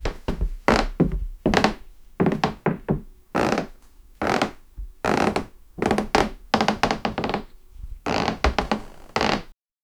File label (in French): bruit de pas sur planche; household